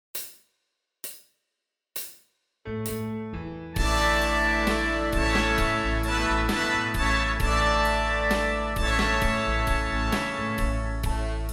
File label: Horns in Fifths